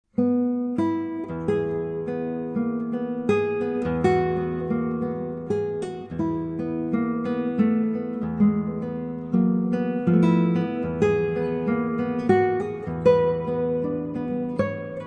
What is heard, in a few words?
drowsiness, classical guitar